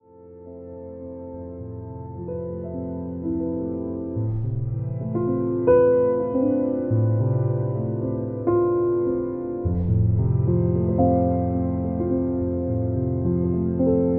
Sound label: piano serenity meditation